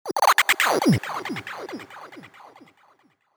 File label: Laser; LD42 SARD inc new ship